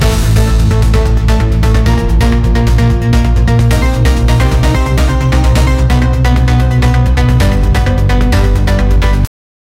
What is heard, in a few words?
fast pace tense music